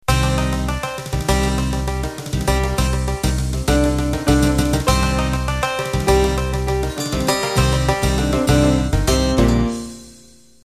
Cajun Country Intro

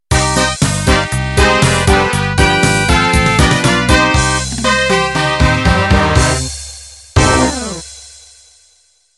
Pop Polka End

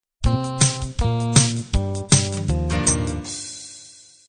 60s pop intro

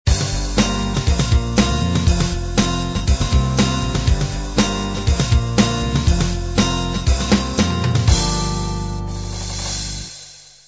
uk pop end

